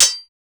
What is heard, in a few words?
16bit sword attack